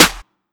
Open Snares

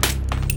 Atmosphere FX

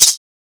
perc; The Lunch77 Sound Design Mini Kit (Vol. 1)

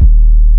Dark 808; 808s

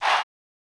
Chants, Vox

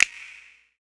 Snap

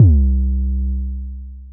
Strike 808; 808s

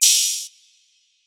Hat Open

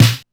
Snare, LinnDrum

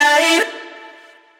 Vox

